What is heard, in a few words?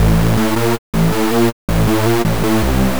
future rave samples, 160bpm, A; MoHoov